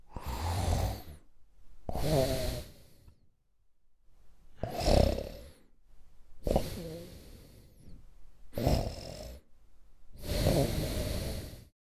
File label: Adult male snoring and breathing